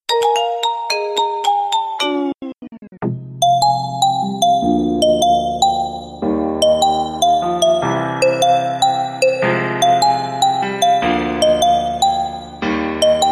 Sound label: Marimba Remix